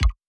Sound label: click